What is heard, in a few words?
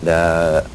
uhh; Bip Boing & autres; BushBeeps